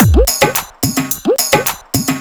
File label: Dholki Beat